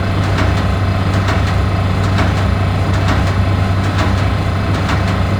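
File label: round machine; Ambience; Warframe; Grineer Settlement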